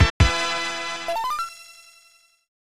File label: Item Fanfare; Flashback The Quest for Identity; SNES